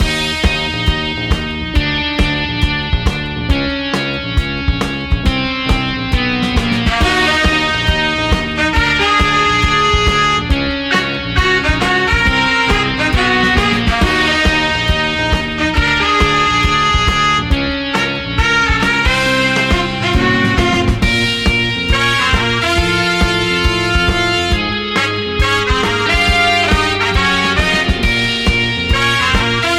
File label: GuitarMusic